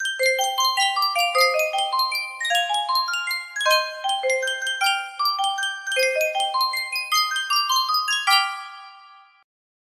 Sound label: sankyo miniature carillon